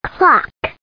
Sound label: clock